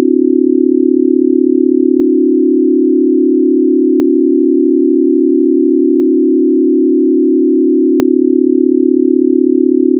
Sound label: alt 78910 buzz